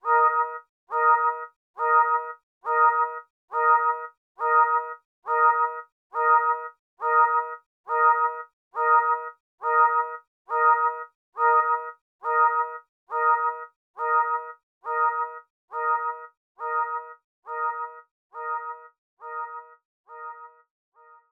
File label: Alarm; YU-NO A Girl Who Chants Love at the Bound of This World